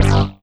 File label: Synth Hit G; Trance; Synth Hits; Zero-G Trance Inducer; 90 s Sample CDs